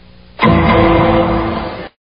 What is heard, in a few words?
The Clang